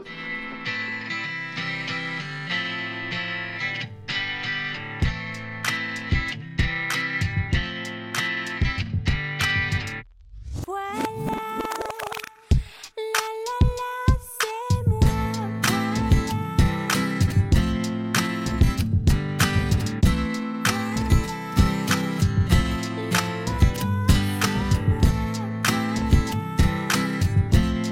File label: Guitar radio